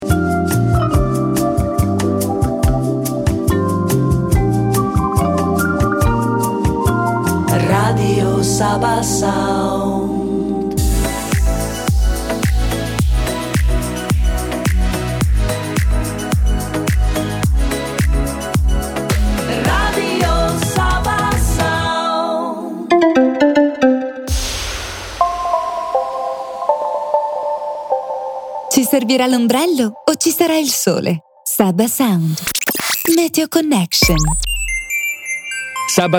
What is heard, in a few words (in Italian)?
jingle e sigle